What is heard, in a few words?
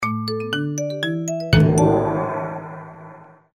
Musical Salve